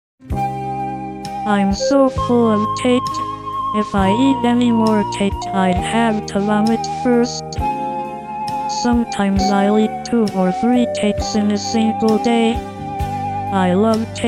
sad droid cake; cake covers

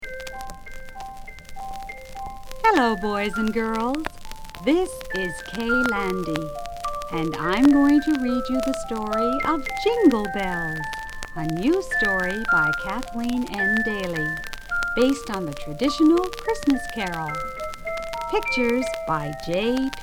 Jingle Bells; Special Ed Xmas

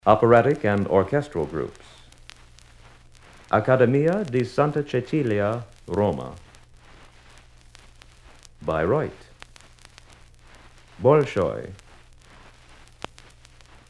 Operatic and Orchestral Groups

